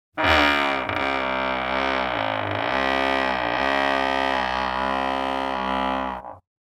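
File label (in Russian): звук двери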